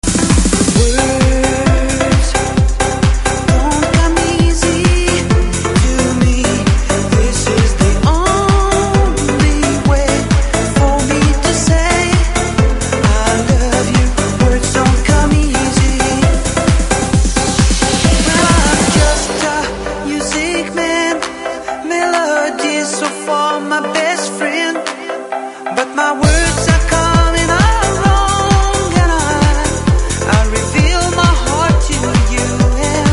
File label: trance remix